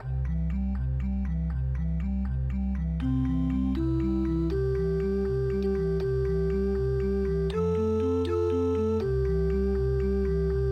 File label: street chorus